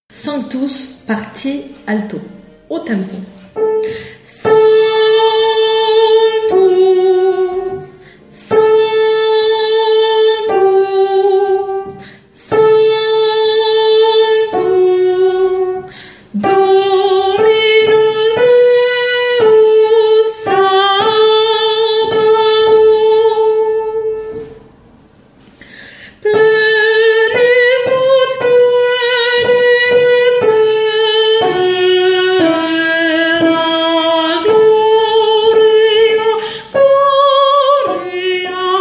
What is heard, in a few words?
Alto; Requiem; Sanctus; Mozart